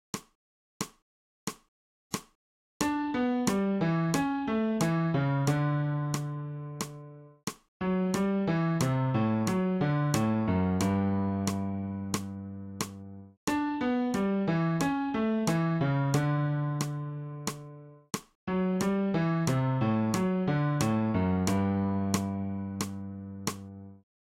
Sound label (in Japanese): 新フレットレス